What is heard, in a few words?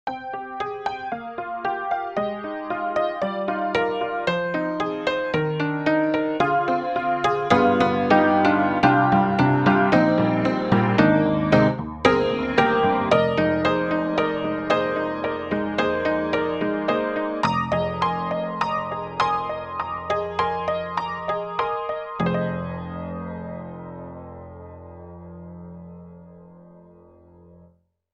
Honky Tonk Upright; IK Multimedia; SampleTank2.5XL